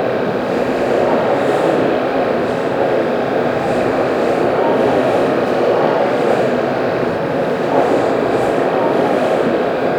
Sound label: seamless loop of hall amb